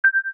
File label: coin